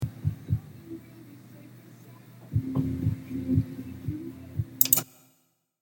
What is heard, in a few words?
sample music